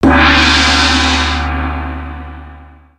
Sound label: gong big